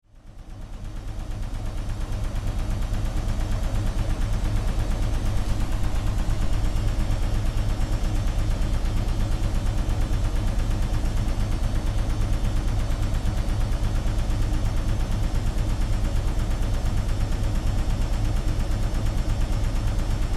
Washing machine washer clothes spinning spin cycle; cleaning